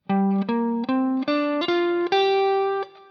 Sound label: G minor pent shape 3 of 5, guitar